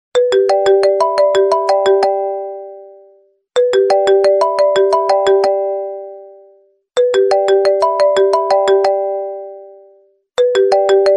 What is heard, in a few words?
ring ringtone; apple iphone 4g